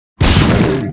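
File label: arcane golem damage, monster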